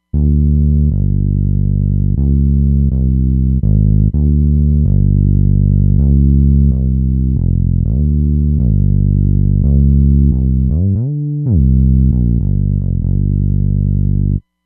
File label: Glider Bass